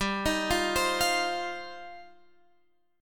G 7th Suspended 4th Sharp 5th G down

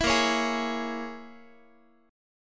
A sharp Minor 7th Flat 5th, Guitar; 19,18,16,18,x,x; 4A,4E,5A,5D,5G#,6C#; strummed

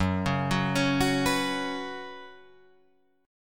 Gb Suspended 4th Gb, down, Guitar, Drop D

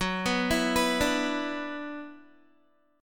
F sharp 7th Suspended 4th Sharp 5th, Guitar

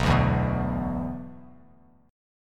B-Minor Double Flat 5th B down, Bass Standard; Bmbb5 strummed; JGuitar

